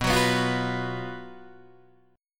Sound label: Gb Major 7th Suspended 4th B, guitar